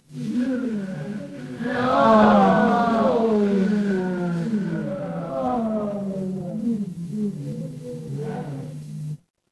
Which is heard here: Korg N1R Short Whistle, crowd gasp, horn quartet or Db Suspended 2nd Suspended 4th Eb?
crowd gasp